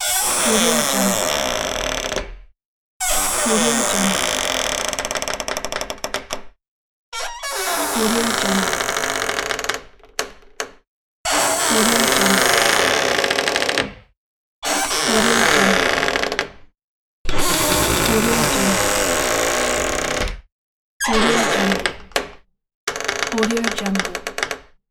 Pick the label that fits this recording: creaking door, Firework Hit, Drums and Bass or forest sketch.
creaking door